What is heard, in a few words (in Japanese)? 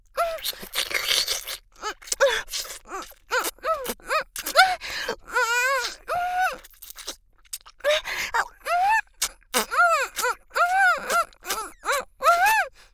フェラ; ありすちゃん（リスみみの地下アイドル＋コンビニバイトAさん）